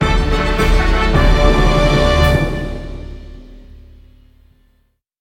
Big Orchestral Win Fanfare

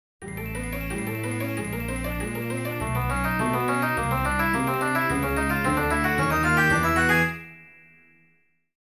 Open Treasure Box; Legend of Zelda Ocarina of Time

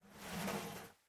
Ventil angle; miside demo windows gamerip 2023

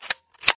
Reload; chicken gun

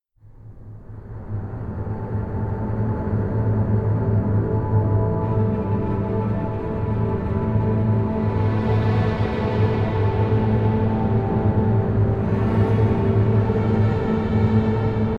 Suspense Intro; Toren

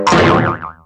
Fall down

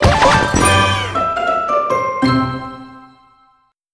Crunchy Finish

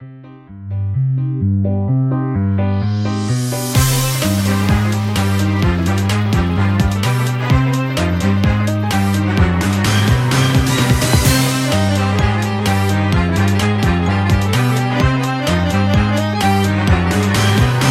Instrumental; Friday Night Funkin' Vs. Henry Stickmin V4

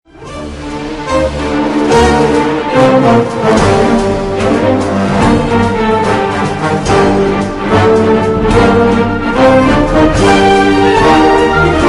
Pirate Battle Music; lego pirates soundtrack

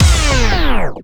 DJ Disco Stop; Plants vs. Zombies Battle for Neighborville

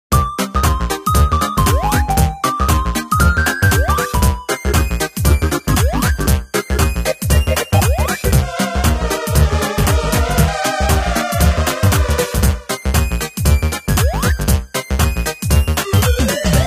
Halloween Chiptune; The Coffin of Andy and Leyley

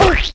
Scratch Squished; Ruins

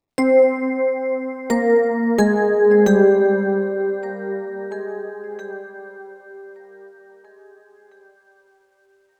Oneshot Rhodes; zombie army 4 dead war ps4 stadia switch windows xbox one gamerip 2020

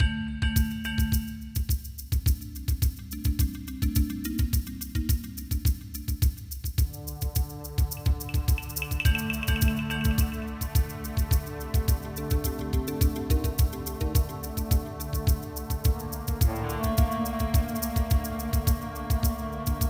Bonus

